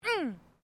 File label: FEMALE GRUNT